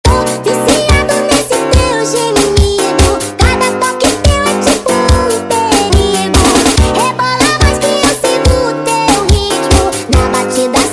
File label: Funk nightcore